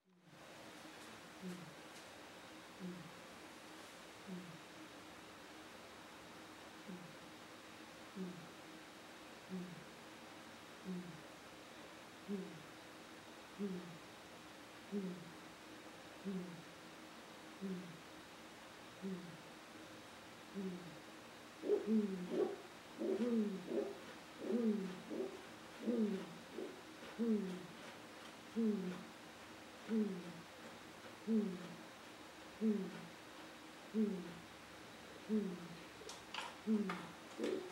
Pallid Scops Owl Otus brucei

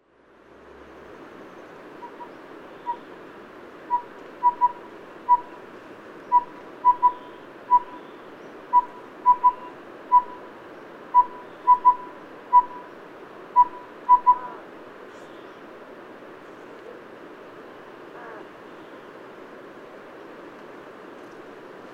Collared Owlet Glaucidium brodiei

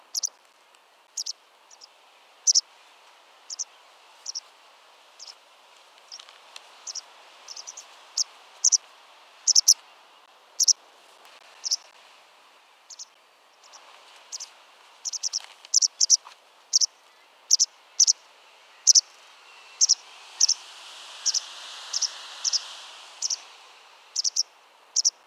White Wagtail Motacilla alba

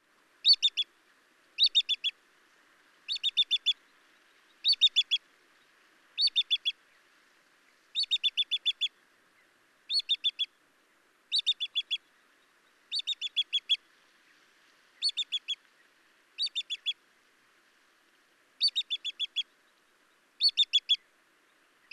Ibisbill Ibidorhyncha struthersii

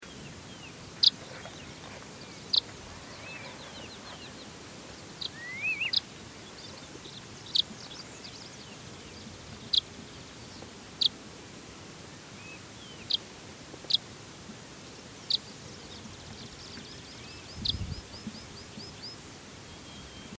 Buff throated Warbler Phylloscopus subaffinis; call

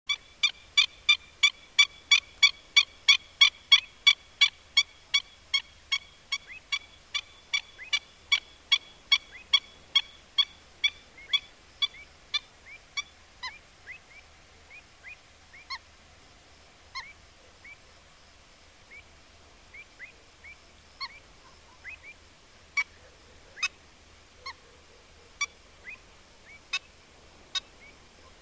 Black winged Stilt Himantopus himantopus

